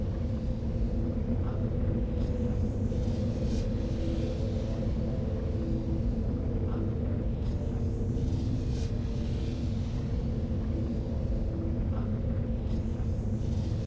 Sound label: lava ruin; portlux